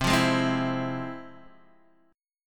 F Minor Major 7th C, guitar chord

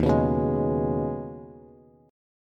D sharp 7th Suspended 4th Sharp 5th D sharp, Bass; strummed; 11,14,11,13; D#7sus4#5; JGuitar

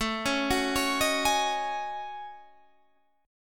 F sharp Minor 6th Add 9th, Guitar

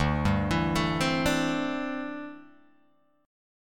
D 7th Suspended 2nd Suspended 4th, Guitar Open G

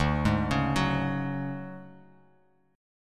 D Major 7th Flat 5th D down, chord; arpeggiated; JGuitar

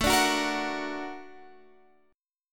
Bb Augmented Major 7th A

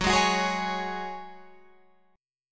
F sharp Suspended 2nd, Guitar Open F; strummed

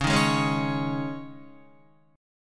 Db Suspended 2nd Guitar 4E,4A,5D,5G,5C,6F; strummed; Dbsus2; JGuitar

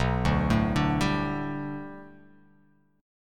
B Minor 7th Sharp 5th B, chord

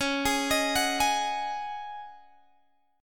Db Suspended 2nd Suspended 4th Db Guitar Open G

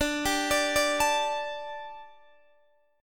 D Suspended 4th D, Guitar, Open D

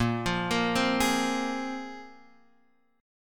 A sharp 7th Suspended 2nd A sharp, Guitar Drop D

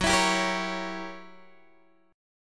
F sharp 7th Flat 9th F sharp down Guitar Open G

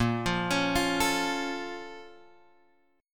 Bb Minor Major 7th Bb down Guitar Drop D, chord; BbmM7; arpeggiated; JGuitar

